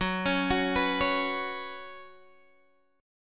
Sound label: F sharp Suspended 4th, Guitar, Open G; x,11,11,11,12,11; down